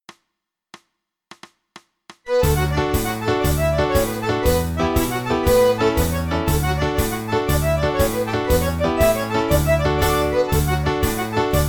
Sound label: Traditional Irish Jigs D